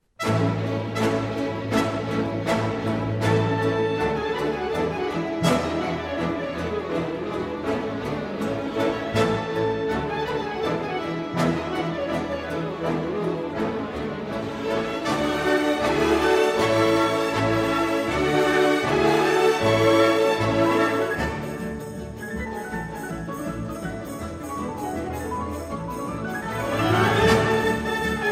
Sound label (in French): Danse Thème A Suite pour orchestre de variété; Danse no1